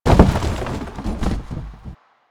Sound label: Cardboard Boxes Destroy; Warframe; Ambience; Nineteen Ninety Nine